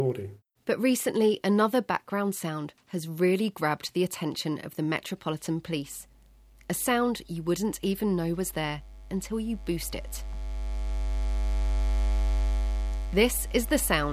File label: recording hum; bbc; metropolitan police